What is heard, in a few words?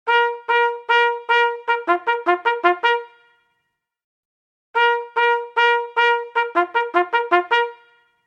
Commence Firing, bugle